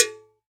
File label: JANGKOQUI, Latin Hand Perc